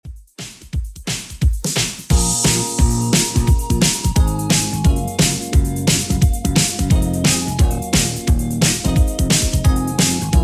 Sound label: 80s Redrum 175bpm